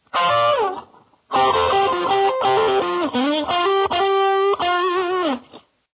solo

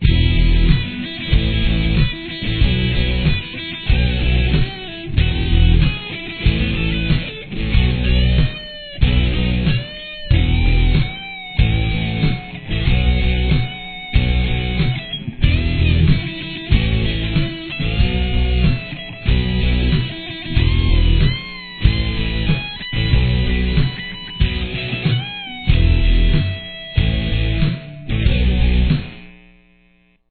solo

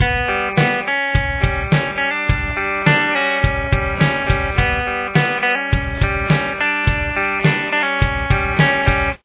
main guitar; Verse 1

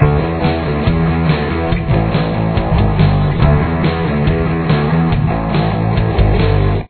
pre verse

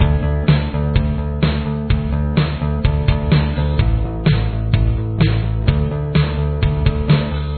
bass

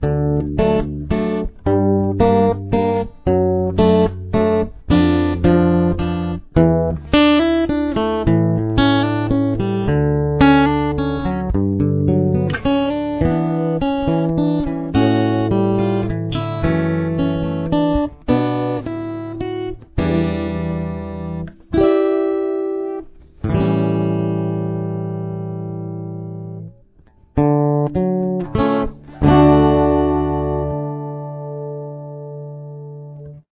chorus, guitar

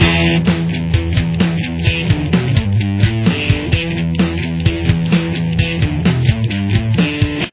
interlude

